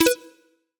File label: guess